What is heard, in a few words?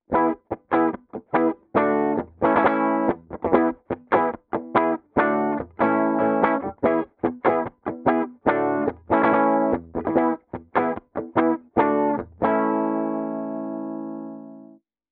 clean bridge rythm; vintage